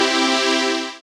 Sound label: SYN PADOC; Sound & Vision - Gigapack II